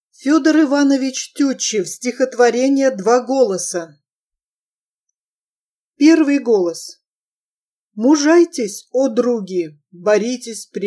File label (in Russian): Два голоса